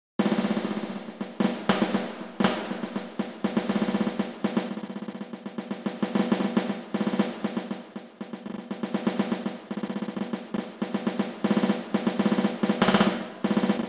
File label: Snare Drum Study